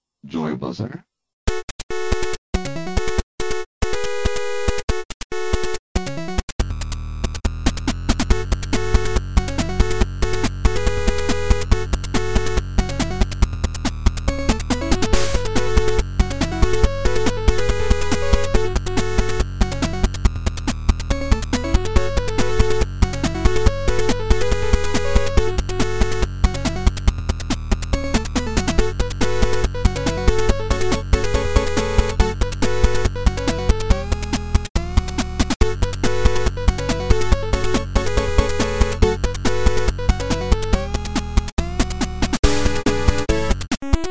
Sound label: 8 bit mix